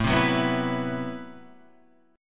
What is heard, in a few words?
A Minor 6th Add 9th, Guitar, Open F; Am6add9 strummed; 9,7,6,6,7,6; JGuitar